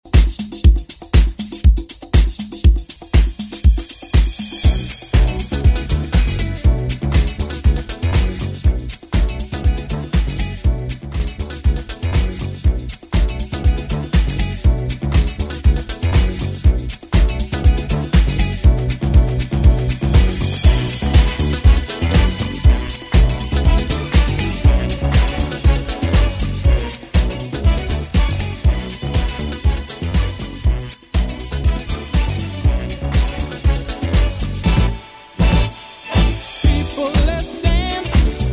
80s ReDrum; DJ Mhark

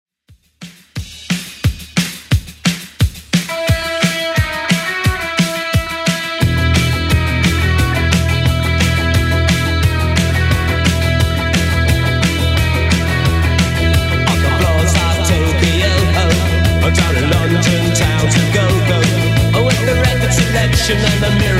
80s Ext Redrum 176 bpm

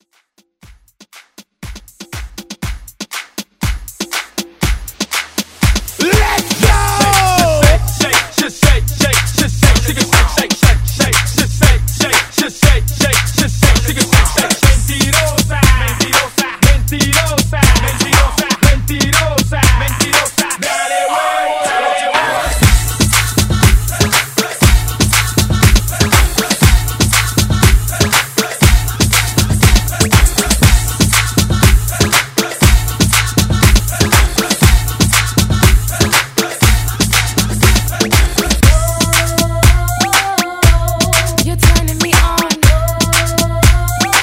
Throwback Hype Redrum

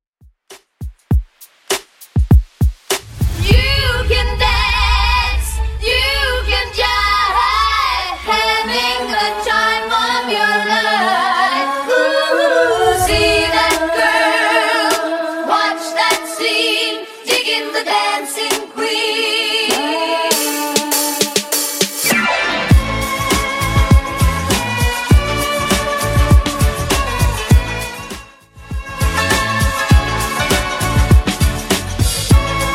70s Epic Intro Redrum 100bpm

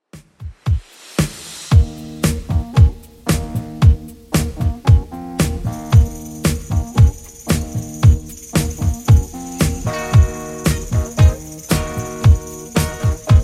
70s Redrum 114bpm

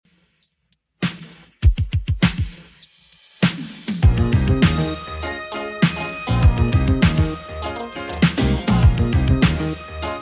80s Redrum 100bpm

